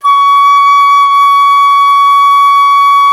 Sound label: FLT, WOODWINDS; Roland - LA Composer vol. 1